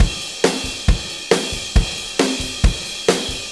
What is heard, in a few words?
4-4 Loop, Drum Loops, Full Kit Loops; Drum Tools